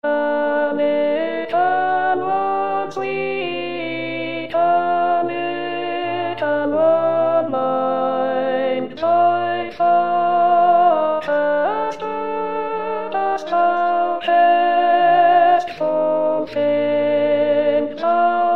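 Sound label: Sweet Alto; O Little One Sweet; xmas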